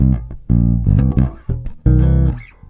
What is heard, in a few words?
178 funky fingerbass double stop